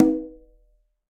Conga B Open; Latin Percussion; Drums; Drum Hits; Ableton; Factory Packs

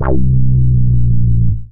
Funk Plush Bass, analog; Ableton; Factory Packs; Synth Essentials

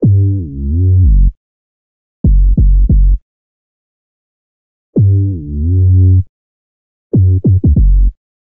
F# Minor 83bpm, Bassline; MIDI Clips; New Old School; Ableton; Factory Packs; Chop and Swing

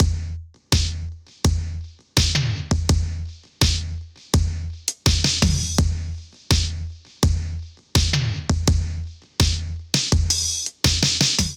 Sage Reef Kit 83 bpm, Drums, Basic; MIDI Clips; Drive and Glow; Ableton; Factory Packs

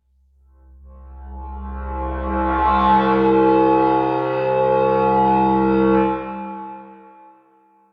Buzzsaw Texture, Drone; Instruments; Laboratory

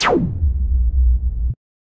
Vinyl Scratch Laser; Instruments; Effects; Ableton; Synth Essentials; Operator

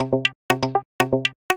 Muted Guitar, Vector Wave Sequences; Ableton; Retro Synths; Components

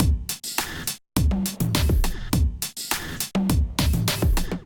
Animal Kit groove 103 bpm, Drum Essentials; MIDI Clips; Ableton

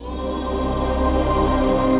Angelic Choir; Instruments; Disembodied Voices; Ableton; Mood Reel

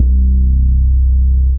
Sub Octave Bass Drone; Synth

